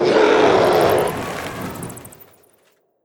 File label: Orc Scream Terror